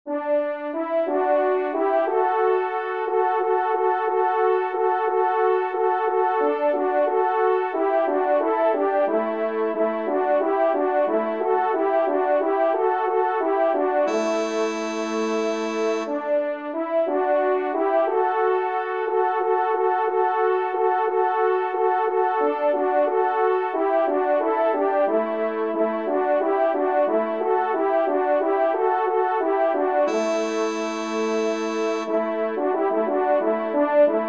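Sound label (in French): caprice, Do majeur, ensemble, trompe cors; Corrette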